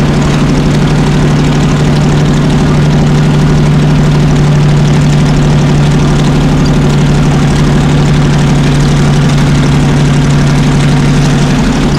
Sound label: panther engine int rpm load, engines tanks; War Thunder